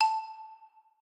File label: button General; wild turkey